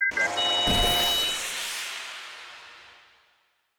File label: sam shoot; OpenFrontIO